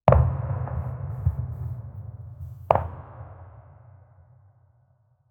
faint ambient castle

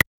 soft ui text typing sound

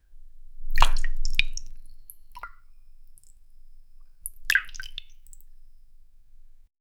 the thick viscous liquid

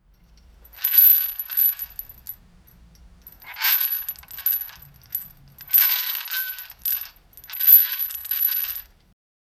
chain creaking and groani

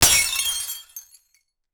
glass shattering, breakage

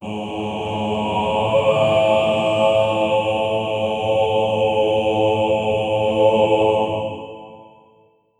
intimidating angelic choi, angelic

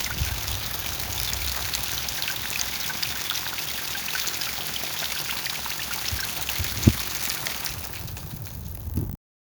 watering crop soft, farming

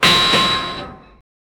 distant metallic clank of, factory

